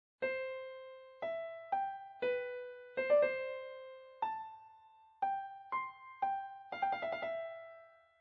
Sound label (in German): Gehoer Terzen; Gehoerbildung